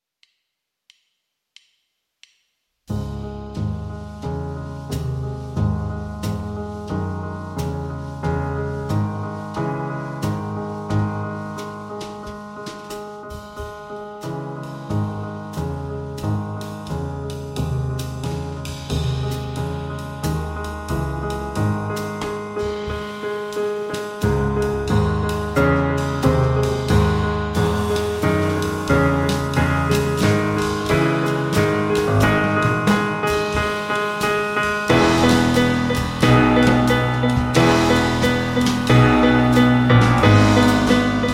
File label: In D-Flat Major, Arr. For Jazz Ensemble; Raindrop